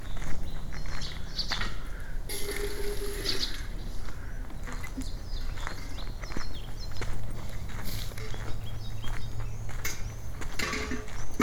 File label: soundwalk, sergiyevposad